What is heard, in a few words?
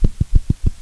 Gorilla Feet; Playable Tutorial Level; TR1